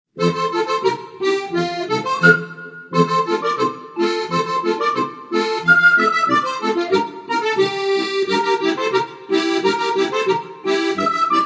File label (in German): Techtelmechtel-Polka